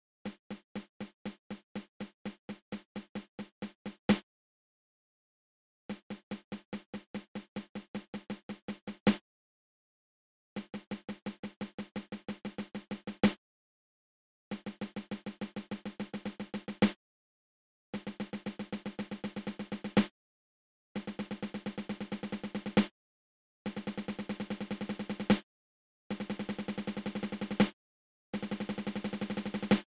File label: Seventeen Stroke Roll open close open